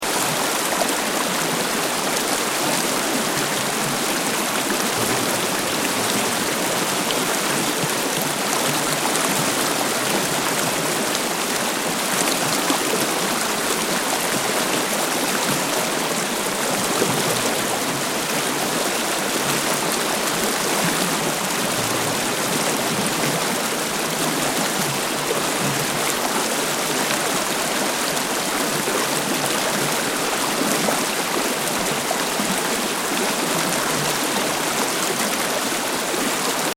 Water Stream Fast Rocky Close Loop; Elemental weather effects; Nature

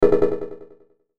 Hit Electrical, Hit Electrical Burst High; Electrical Button; Blastwave

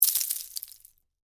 Debris Drop Penne, Rigate; Texture; Materials; BlastWave

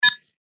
Single Beep; Beep Sound Effects; electronic sound effect

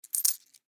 Coin Hand Movement, Coin Hand Stack Multiple; foley; Money Sound Effects; Objects; Blastwave

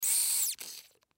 Spray Medicine, Spray Nasal Single Squirt; Spraying (Sound Effects:Objects); Blastwave; Foley

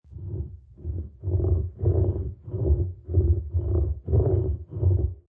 Creature Breathing; Monster creature, werewolf, fantasy animal or beast, taking short breaths; Lynne Music

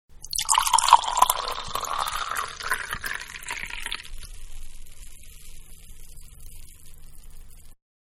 Wine Pour Into Tall Glass; Pouring; Manhattan Production Music

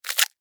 Insect, Eat, Bite, Bug; Animals:Insects; Insects Eating; Blastwave

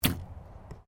Archery Hunting Bow Firing; Various archery sounds; Miscellaneous Sports

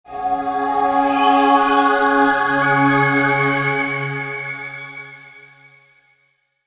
Startup, Computer: Short Musical Style Phrase

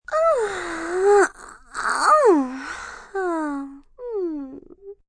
Yawn, female, high-pitched moan (human voice); Yawning Sound Effects; Part of Yawning (Sound Effects:Voice)

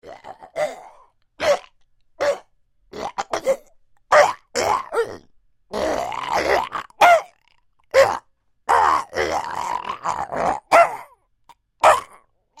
Creature Troll Grunts Pain Series; Monsters; Horror; BigRoomSound

